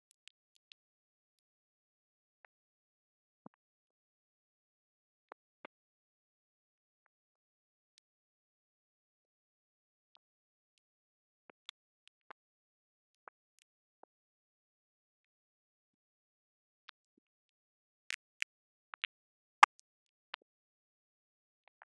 Audio Clicks Only mouth noises, swallows, and mouth clicks; Potential ASMR